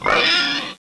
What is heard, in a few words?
pig squeel